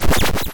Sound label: Rise Wobble, Analog, Synth Misc; Ableton; Factory Packs; Synth Essentials